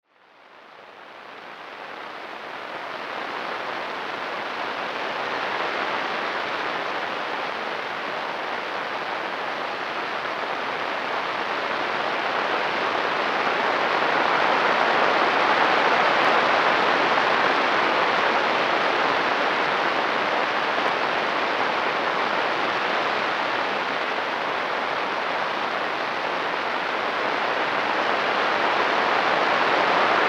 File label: Sun 25.23MHz; Ashcraft